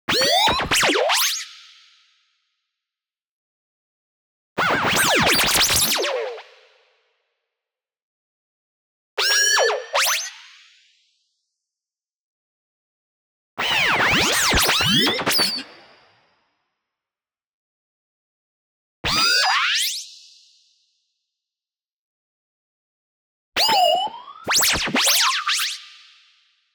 LASER Ascending Action Movement; boom library; Anime Essentials

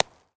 Baseball Bounce Dirt

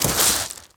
Bodyfall Gravel; SFX Kit; Bodyfalls

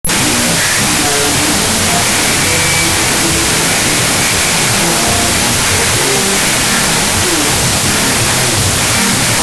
Radio Tuning; 1001 Sound Effects; Foley

